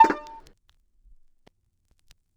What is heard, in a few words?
bit perc